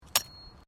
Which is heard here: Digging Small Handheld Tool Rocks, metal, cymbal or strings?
Digging Small Handheld Tool Rocks